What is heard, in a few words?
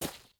gravel, step